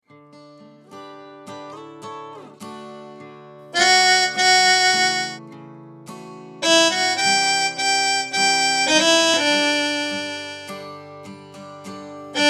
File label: instrumental viola